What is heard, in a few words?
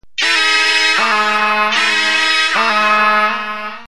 wakeup